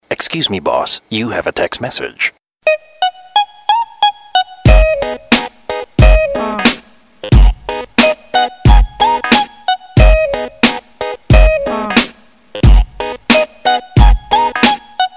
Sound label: hip hop